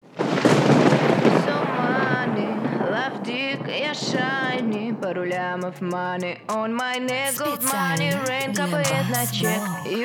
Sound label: 31-35 Hz BASS